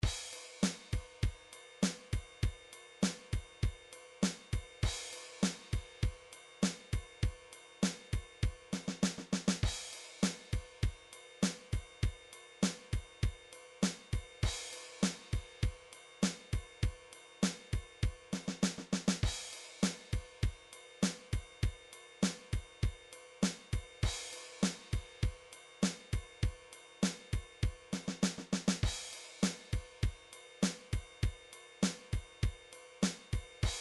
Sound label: drums, midi drums